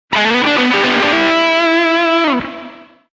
SOLO; MOBICLIC 83; CPLUSCLAIR

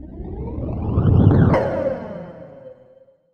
colide thing; wob2 demo

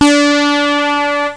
tone

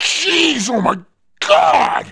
see death; Messiah

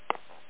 footstep on rock

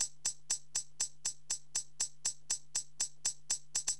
RYTH

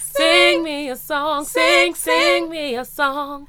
UOW, Vocal Samples

